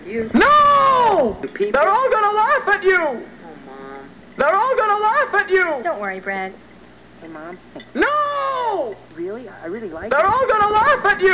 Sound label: laugh